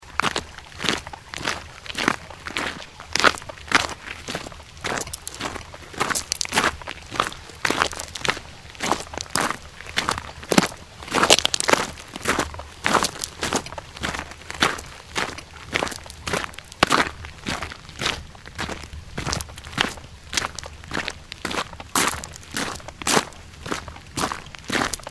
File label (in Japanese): 砂利と草の上を歩く音